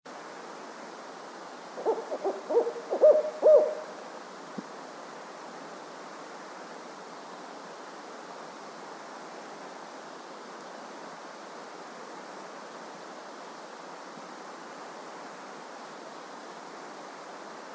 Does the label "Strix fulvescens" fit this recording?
Yes